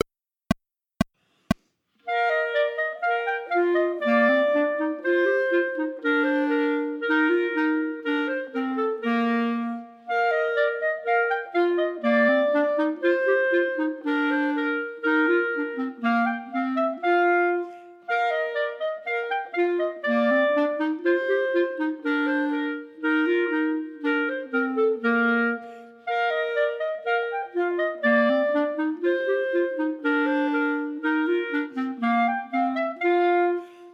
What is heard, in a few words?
March, minus 2nd part, Clarinets; Partita in F; Chamber Music for 3 Clarinets Vol I